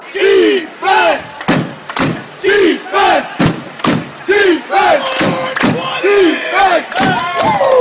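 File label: d fence, football chants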